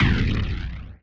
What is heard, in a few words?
breakbeat